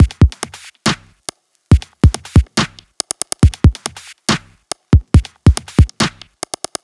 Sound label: 140 drum loops; Dynamix; M8; Electronisounds